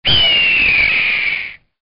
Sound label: Raven